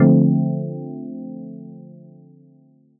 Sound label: smooth deep house rhodes chord hit Dm